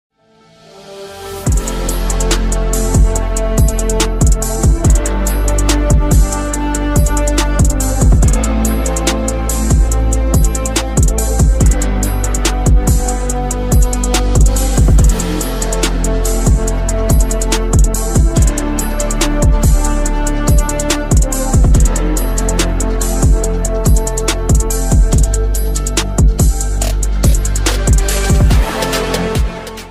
bgmi ringtone bgmi remix ringtone; pubg; battle ground mobile india

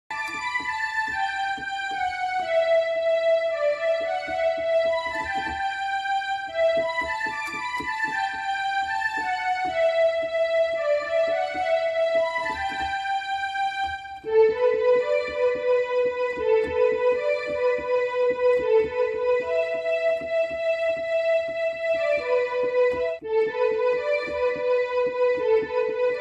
movie bgm ringtone

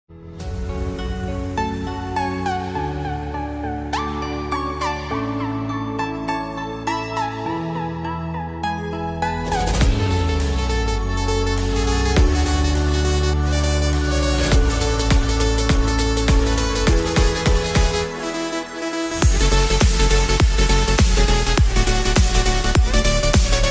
play instrumental ringtone

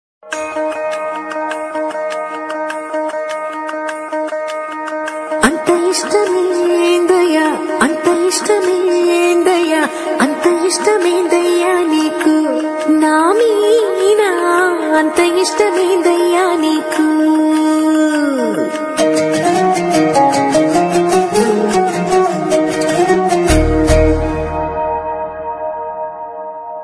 song bgm ringtone Telugu bgm ringtones; bheemla nayak movie

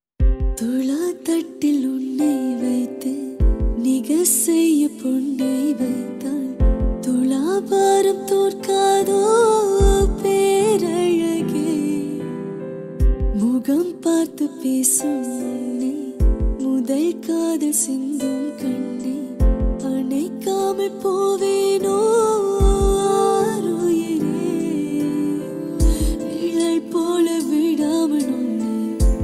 love song ringtone

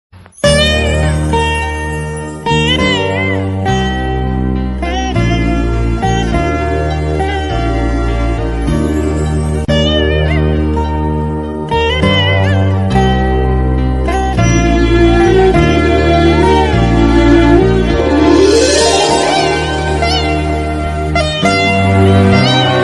movie bgm love bgm music instrumental; manmadhudu movie

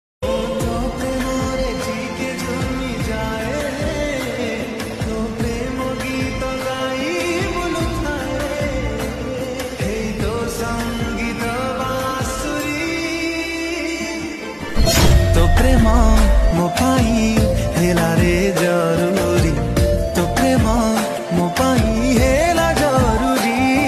romantic song, odia

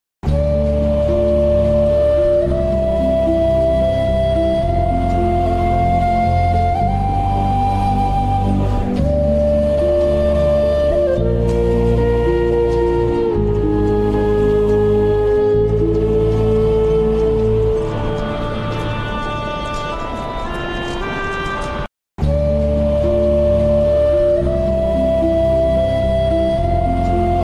flute ringtone; surya putra karna

